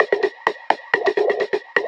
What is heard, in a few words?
FX Loop 128 BPM, House